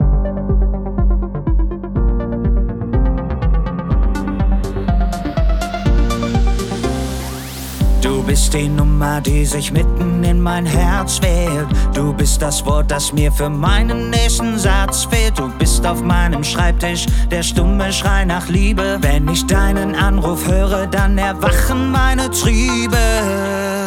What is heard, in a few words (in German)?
Pop gemastert mit Gesang